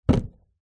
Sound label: foley wooden box case set down gently hard surface; zapsplat